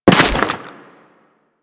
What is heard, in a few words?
Crewman Step Dirt, Footsteps; Warframe; Corpus